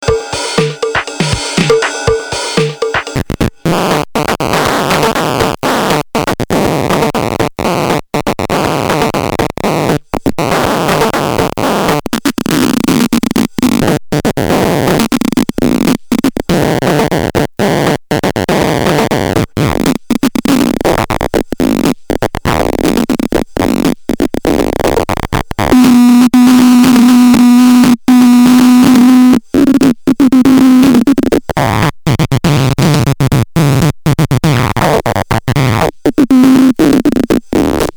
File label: phaser